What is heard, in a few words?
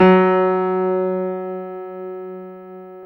PIA MICRS, MICRO-PIANO, Roland; Sound & Vision - Gigapack II